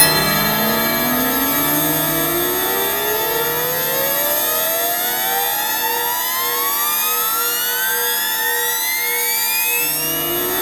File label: A3 siren; Northstar; The Wizard VOL-1; KAOSIREN